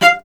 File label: STR VC F 2, Cello; Miroslav Vitous; Solo Instruments VOL-1